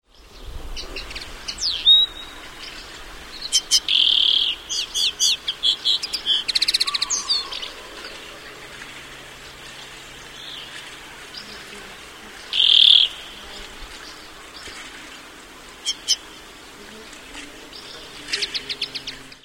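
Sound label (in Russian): konoplyanka golos, linnet voice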